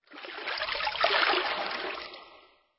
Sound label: splash